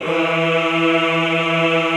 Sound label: EHH E2, Classical Choir; Best Service